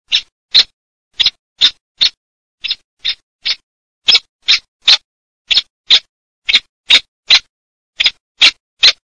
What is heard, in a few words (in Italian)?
PERNICE SARDA, birdsound